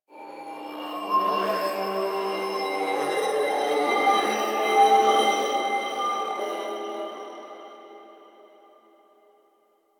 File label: Glass Texture Med, Ambience; Warframe; Cephalon Weave; DE